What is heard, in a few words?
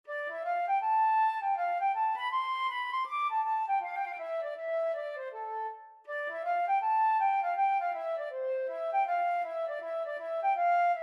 lamentation flute